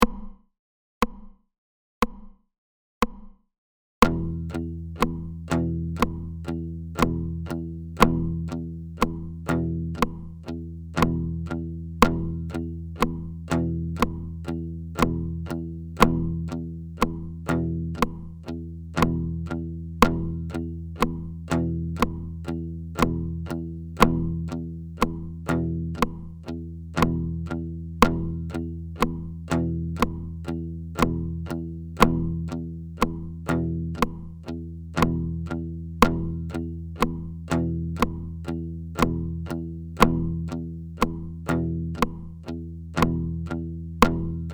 Accents sur rythmique rock 60

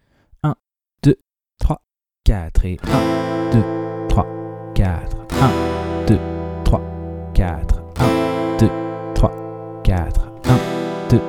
rapide Enchainement de Am à Em

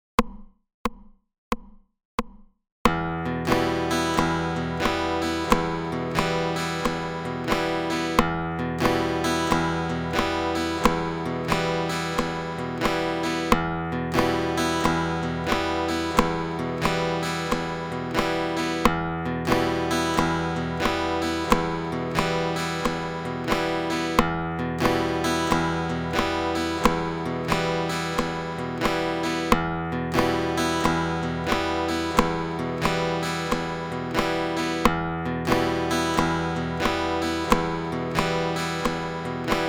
croches sautées et basses 90

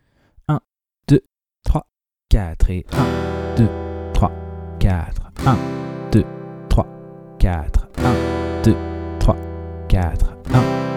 rapide Passer de Em à C

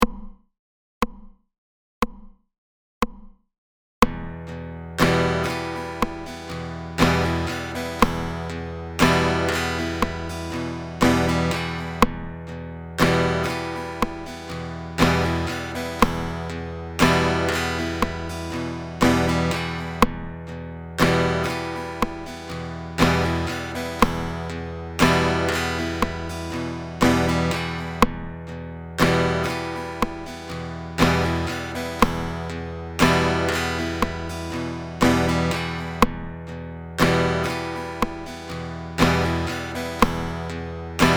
Accent sur rythmique rapide 60

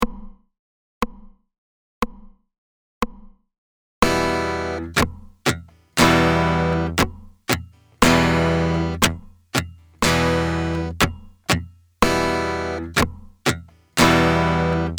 Deux ghost notes 60

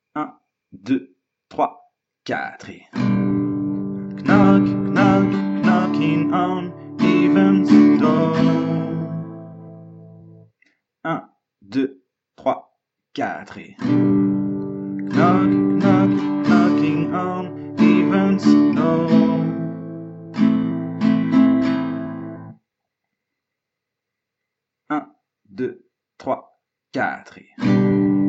Refrain chanté Rapide

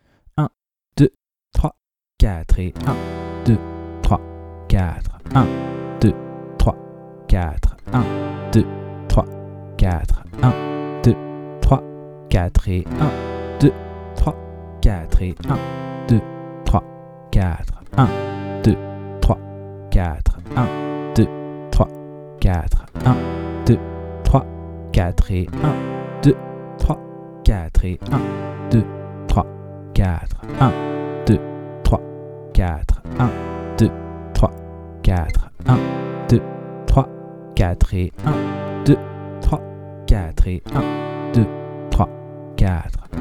3 rapide Travailler la grille; Grille d'accords populaire avec les rythmiques

